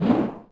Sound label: Swoosh Weapon; Civ4 warlords